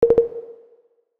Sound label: notify; Original TK Sounds